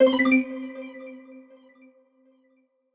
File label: Reward text Jingle